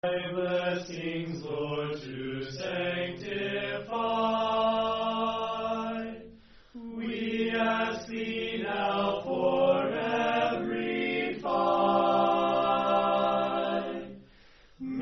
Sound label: Opening Ceremony Song